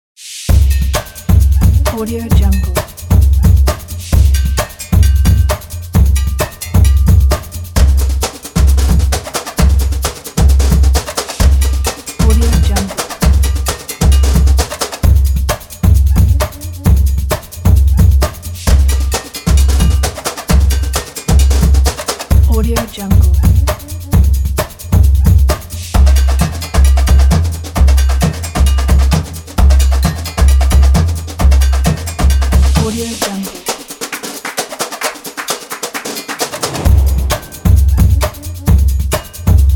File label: happy drums